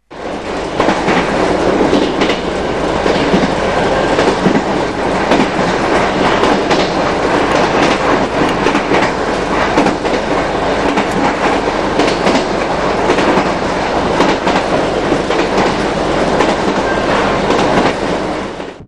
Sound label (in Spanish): traqueteo